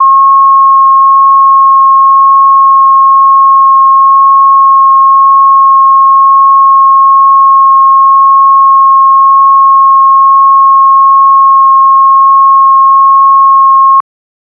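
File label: high level alarm sound for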